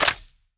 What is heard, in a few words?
m4a1 silencer bolt back; arccw go